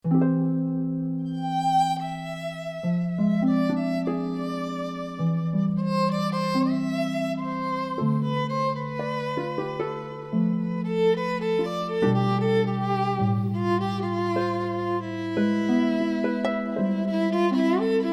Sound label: Viola; Clair De Lune